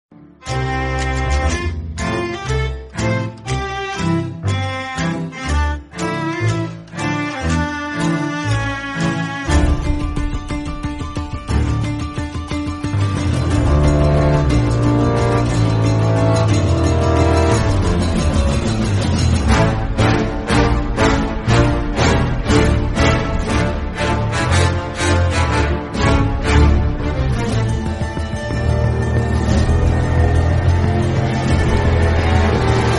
Sea of Thieves instrumental theme

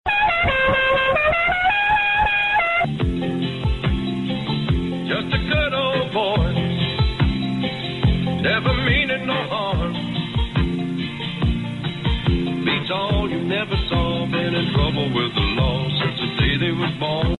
car horn sound; Dukes of hazard

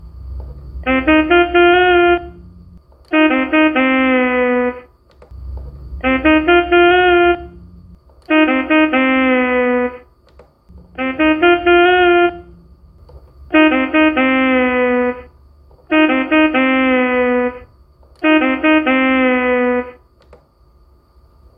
saxophone player music

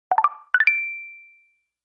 notification sound; Tinder

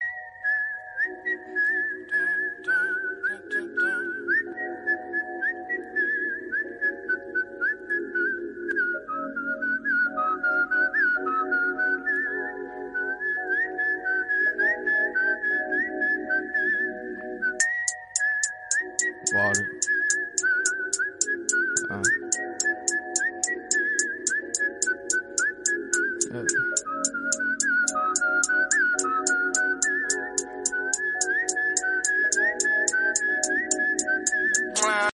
Whistle sound

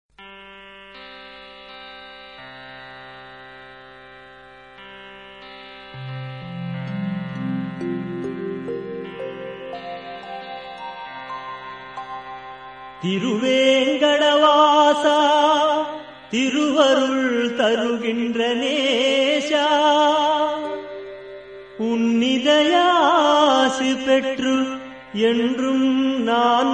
Lord balaji song

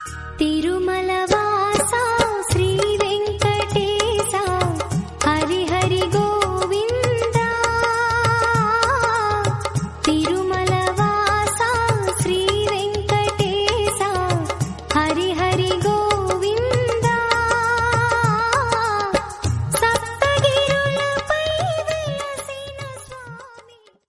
Telugu Devotional Venkateshwara Song

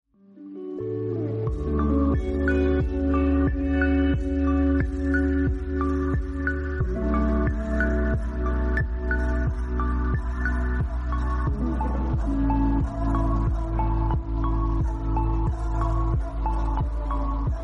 Theme Music; Instagram

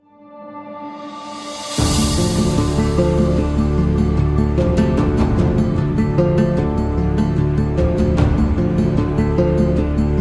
Game BGM; Age of Wonders 4

